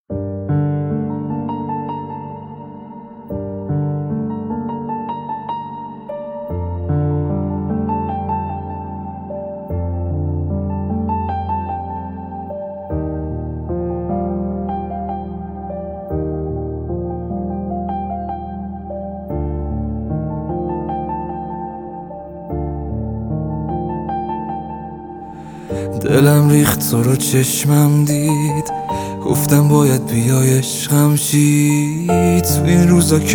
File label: Piano Version